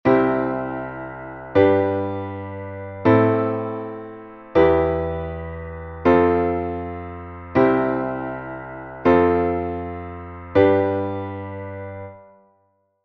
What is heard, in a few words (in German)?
Akkordfolge Pachelbel